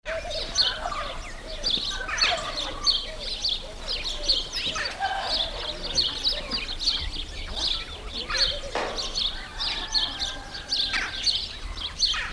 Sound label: Countryside Farm Ambience